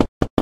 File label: plastic box strain; physics; Source Engine; cstrike